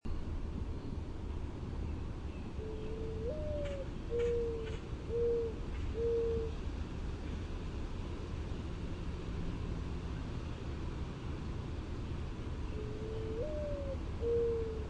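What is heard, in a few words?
Mourning Dove coo whistling flight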